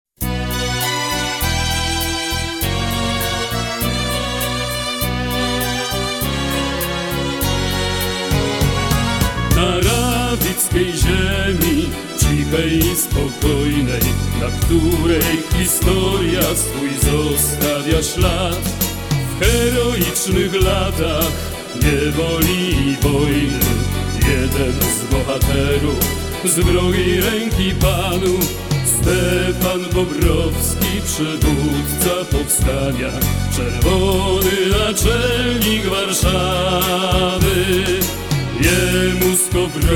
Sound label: hymn